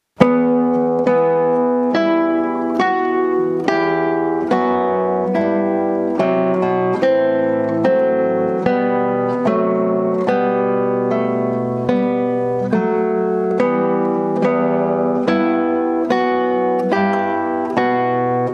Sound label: gtr; kojyo